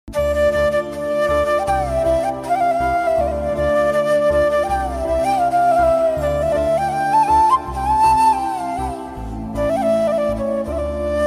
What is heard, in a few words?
flute cover, flute bgm ringtone; trending; meeshamadhavan